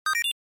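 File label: Game Boy General Pitch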